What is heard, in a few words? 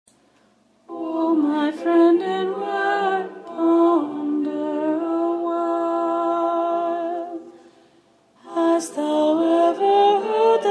mezzo soprano